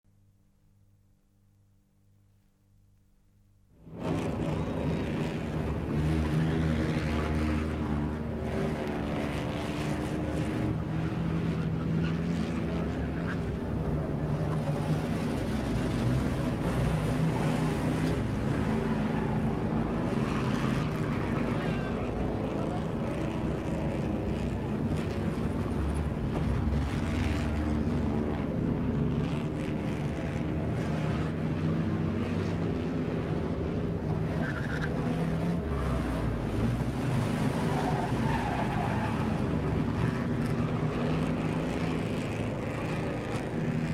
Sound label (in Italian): PASSAGGIO AUTO DA CORSA